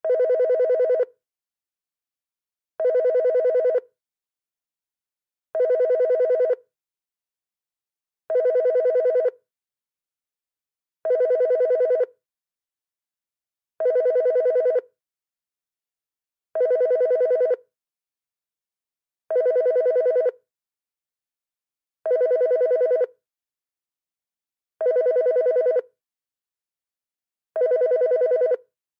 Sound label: Telephone Ring Loop; Electronics; Office; Blastwave